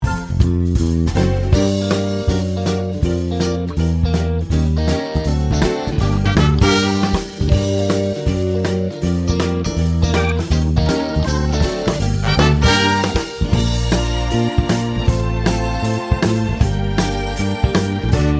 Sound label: Backing Track